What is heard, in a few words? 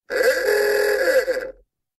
Old Car Horn Sound Effect; car horn errrrrr